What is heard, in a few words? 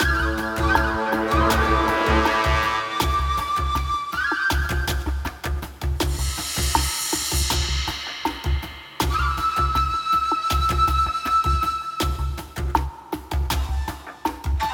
TENSION LOOPABLE